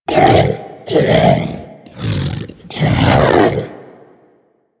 Predator Vocal Captured Idle; Warframe; Hunting Critters

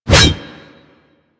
Ghoul Devourer Melee Swipe; Warframe; Grineer Ghouls

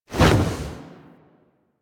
Wall Latch End, Foley; Warframe; Tenno

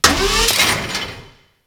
Shipyards Robot Arm Large Lower; Ambience; Props; Warframe; Grineer Shipyards

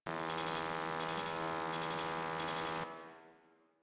Mine Idle Loop; Warframe; Veilbreaker; Sprag

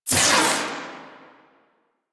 Proj Tap Whoosh; Warframe; The New War; Quests; Weapons; Omicrus

